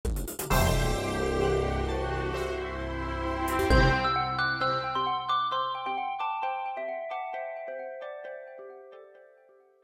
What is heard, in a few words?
Lightning (End); bejeweled classic